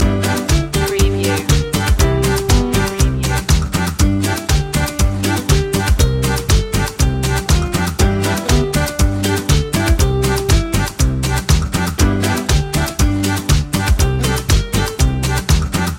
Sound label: fun in the sun no vocals loop